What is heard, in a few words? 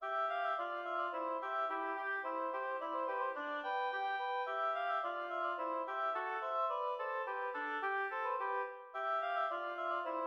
oboe duo; ernest krahmer